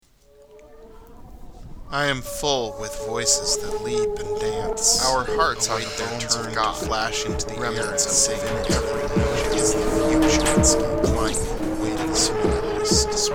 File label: Dissonance Remix